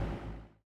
stop, vehicles explorer; gmod; sgmcars

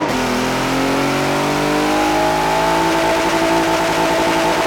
second, vehicles explorer; gmod; sgmcars

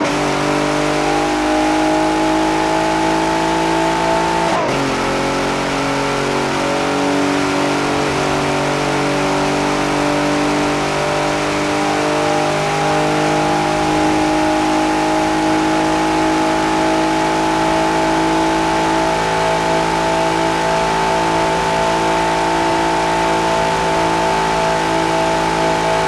fourth cruise, vehicles explorer; gmod; sgmcars

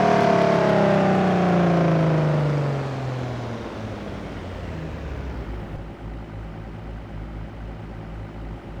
throttle off slow, vehicles explorer; gmod; sgmcars

